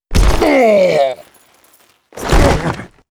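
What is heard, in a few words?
death, monster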